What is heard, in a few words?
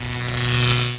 BFG WATER